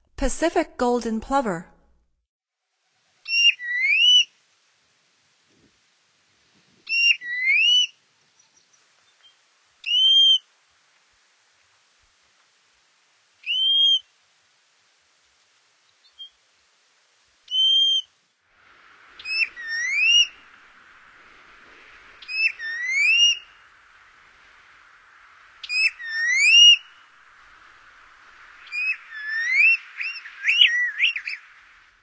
Pacific Golden-Plover, Songs of Birds of North America; Stokes